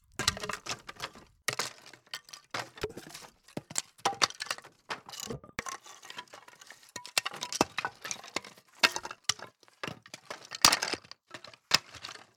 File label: Wood Panel Board Debris Clatter Falling Constant